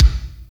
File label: DOA KICK; Drums In Your FACE!